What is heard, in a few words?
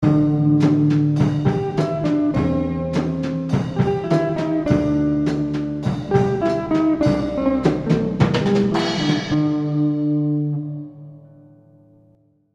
short blues